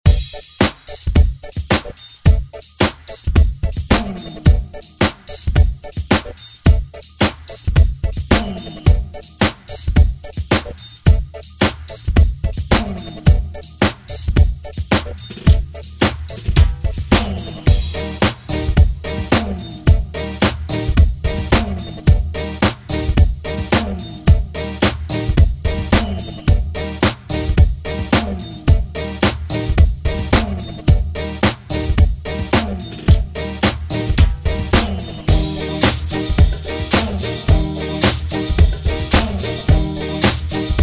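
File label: Reggae Extended Redrum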